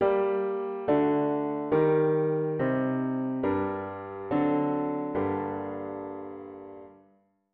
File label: root V7 bass